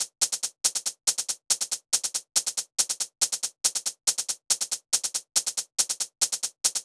drum Loop 140BPM HH

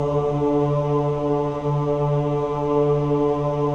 MEN AH, Choir of Ahs; Roland - LA Composer vol. 1

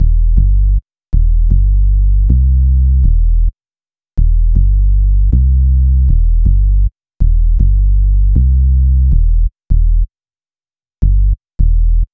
Bass, Hip-Hop

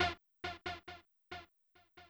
Juno F, Old Skool House, 137bpm; Belfast

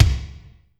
kicks; Dance; DRUMS

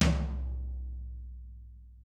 HIT, Drums

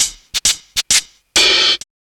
Ragga & Dub Reggae; USB Soundscan vol.27; AKAI

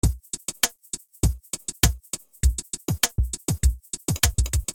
Beat; Sonic Foundry (Sony Creative Software); Infinite Soul VOL-1; Beats

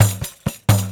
Pandeiro, 4-4 Drum Loops, Perc Loops; Drum Tools

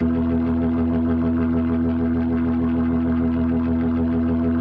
E1F, B3, Vintage Keyboards

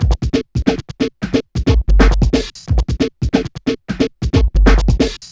buffetbeat 090bpm; Zero-G; Downbeat Dance Grooves

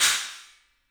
CYMBALS; 3D Sound; 3D Drums

